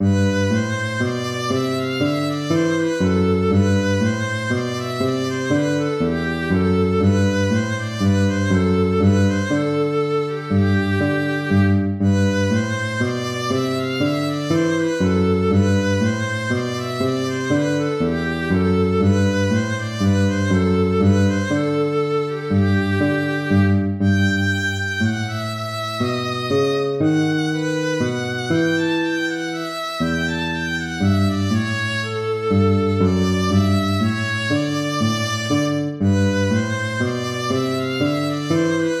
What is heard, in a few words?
baroque minuet major